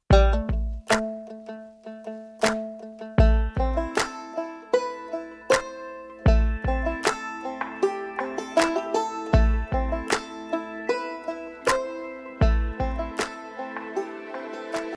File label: banjo ringtone